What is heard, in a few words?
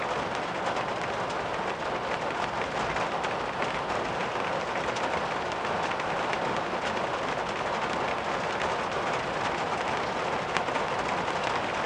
Rain Sounds Night Time Scatter; Nature